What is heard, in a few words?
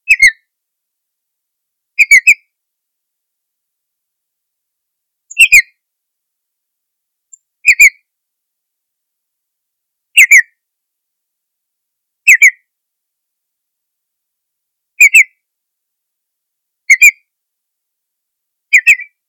Tringa solitaria, Playero solitario